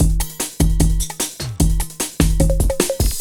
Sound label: LOOP, Eurotech; Sounds Good